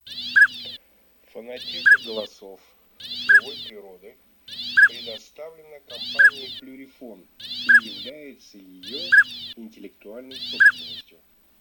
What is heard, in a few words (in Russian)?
Шилохвость Codone; plurifon